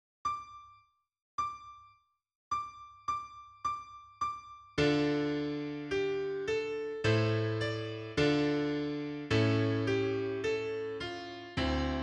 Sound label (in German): Christ ist erstanden Playback